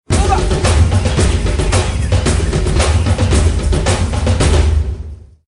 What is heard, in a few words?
win huge; Gorilla